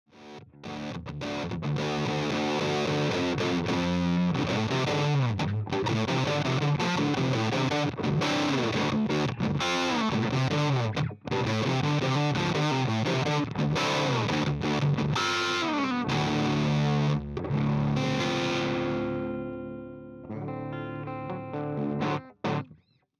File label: Normal input humbucker